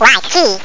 white key; brainkiller

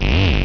Acid FM Acid Bass open; NeoN-B2.0

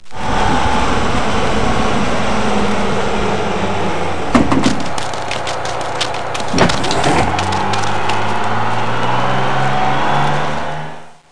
School; Busy people of hamsterland

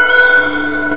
whurr!; turbo invaders

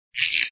stretch

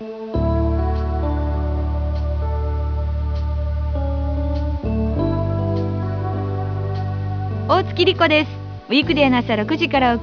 singin

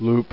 loop; pipe

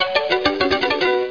Blipple

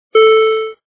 start; race

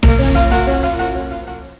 Sound Start; PlayGUI; AkteX

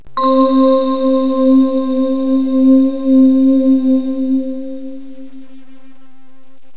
bells fantasy c2; Terra Sound Library; construction set

